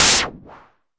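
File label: mbrute noise, Arturia MiniBrute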